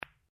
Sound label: Billiards Ball, Home billiards table; Pool Sound Effects; Pool (Sound Effects:Sports)